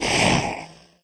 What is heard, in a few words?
stone damage